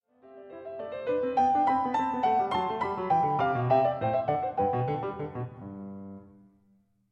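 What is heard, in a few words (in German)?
Variation 3 Canone All Unisuono für 1 Clavier; Canone All Unisuono fuer 1 Clav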